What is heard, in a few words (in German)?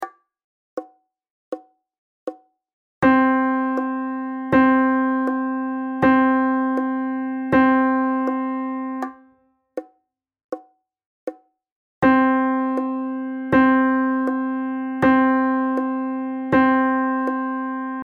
keyboard halbe noten